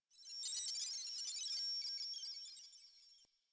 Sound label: Computer Room Ambient Beeping; Ambience; Warframe; Nineteen Ninety Nine